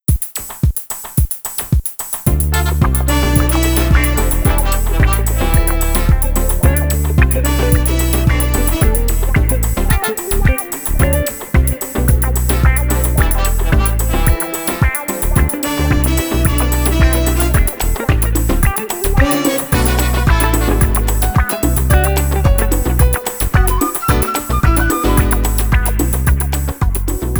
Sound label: Afro Latin, bellydance music